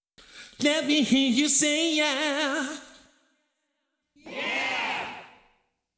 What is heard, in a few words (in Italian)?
LOOP VOCALE